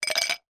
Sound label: food drink ice cubes movement in glass